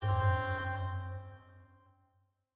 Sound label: instrument note